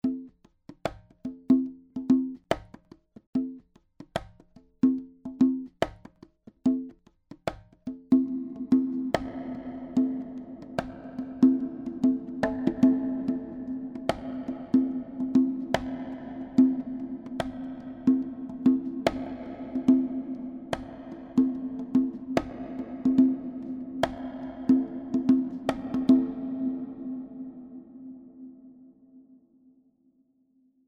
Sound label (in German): Hall